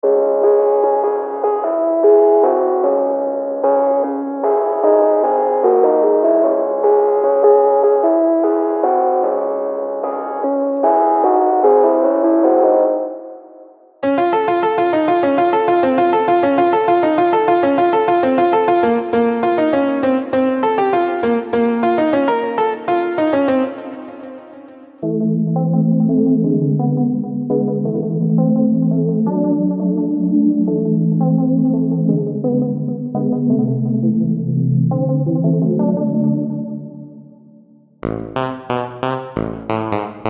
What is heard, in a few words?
Melodic Minor Classical Minor Melodies